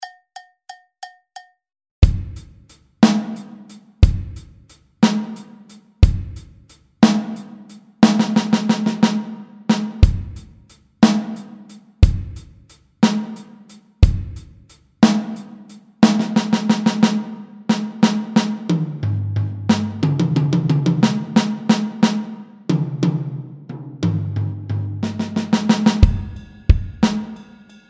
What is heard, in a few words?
Blues solo